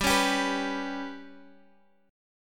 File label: G Major Flat 5th G